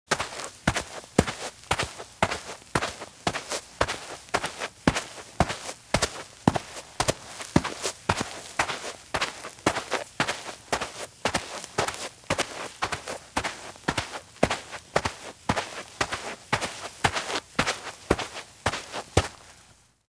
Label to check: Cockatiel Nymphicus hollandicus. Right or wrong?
Wrong